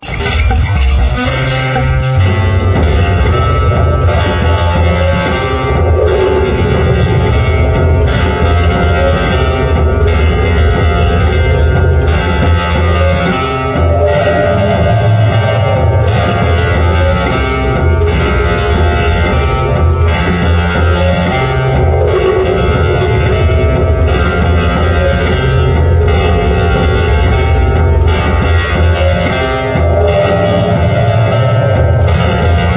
Industrial Jungle

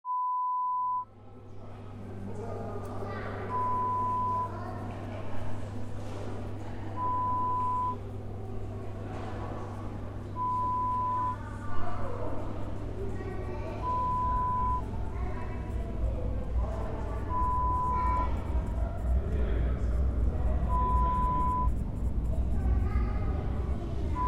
London Underground sound

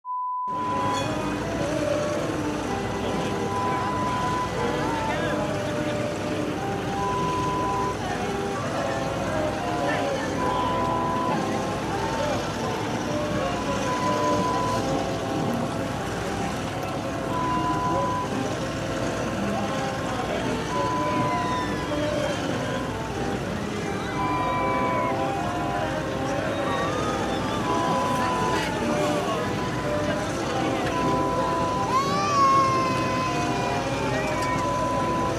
Fairground Ambience sound

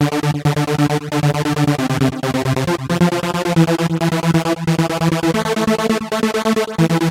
Big Acid Synth

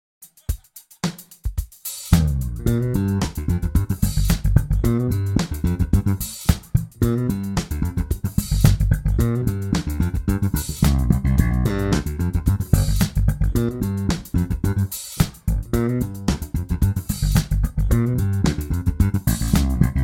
Double thump in G

